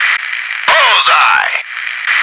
noice

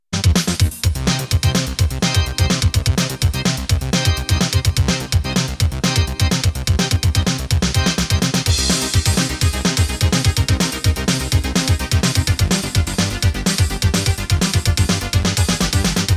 Boss Fight; Jim Power in Mutant Planet; pc engine cd

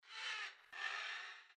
porcupine hiss; Blackeye

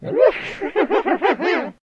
run; I Am Weapon Revival; Jocker

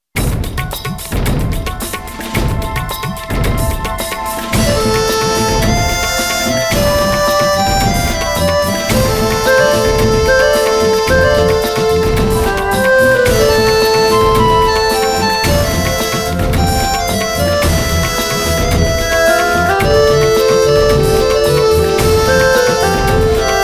Battle Over Water; genso sangokushi original sound track 2004

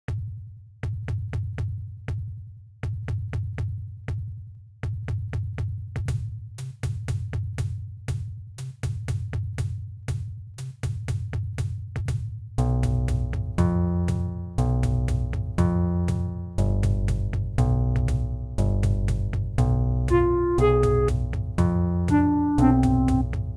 Spooky Fort

